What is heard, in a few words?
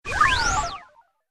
Fast Droid Flyby; Robot Sound Effects; Whoosh Sound Effect